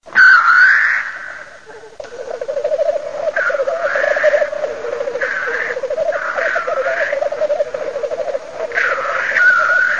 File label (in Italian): GALLO FORCELLO